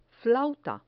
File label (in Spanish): flauta